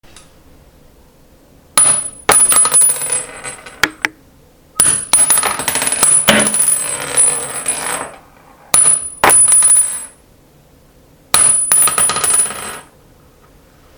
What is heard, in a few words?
High Caliber Shells Drop